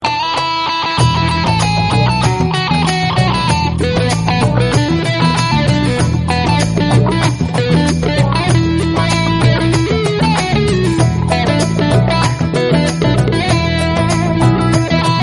folk tune

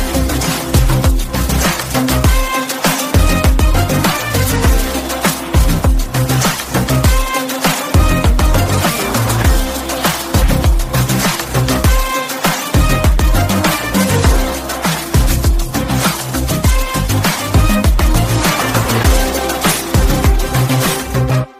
fight bgm; goat metro

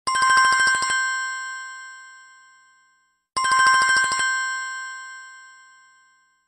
samsung galaxy

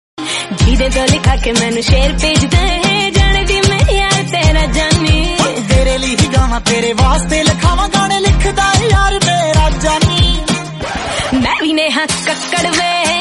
bollywood party song

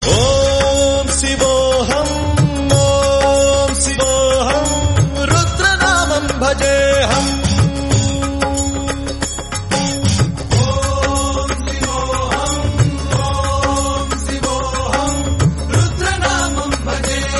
om shivoham

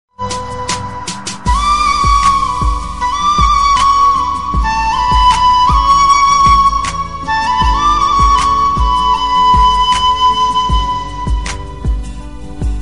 flute, ringtone

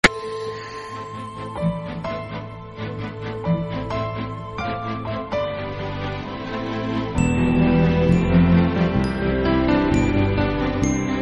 love beat, ringtone

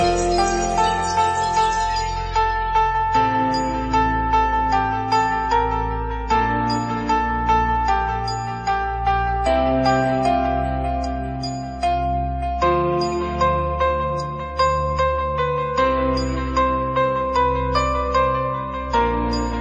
indian anthem, ringtone

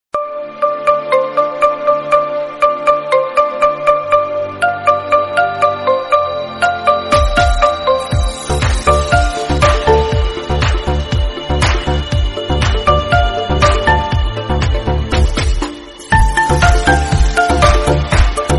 hit song instrumental

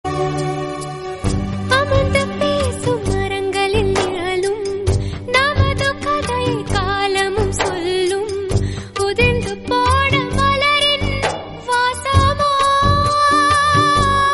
female love

